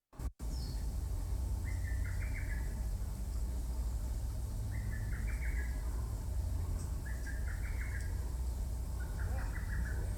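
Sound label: hototogisu, lesser cuckoo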